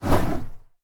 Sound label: Burning Hands Whoosh; Evolve; Meteor Goliath